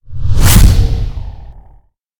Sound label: forcewall, Magic